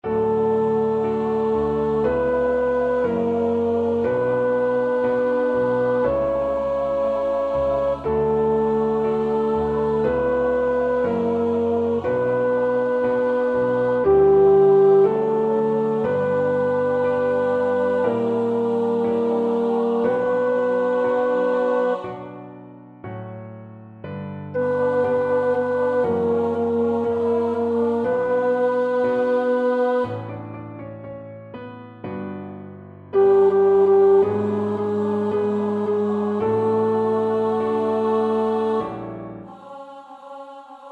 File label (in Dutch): tenor, koorpartij oefening